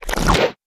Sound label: mud fist miss; wesnoth